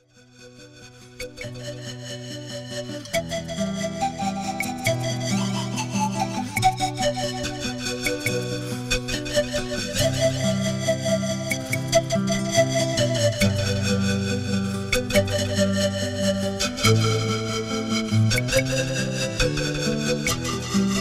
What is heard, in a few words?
Mystic Flutes